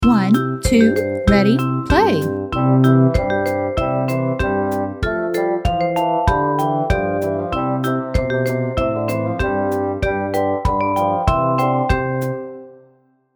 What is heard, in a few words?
ukulele; Rainbow Ukulele